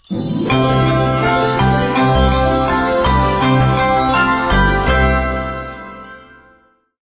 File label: big win; crystal Gems; Core Gaming